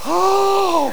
jc lower

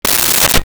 crank, Clock

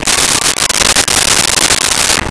LoFi Synthetic Squawk; SONY PICTURES SOUND EFFECTS SERIES

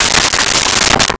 Soda Can Fall; Crashes; 1001 Sound Effects

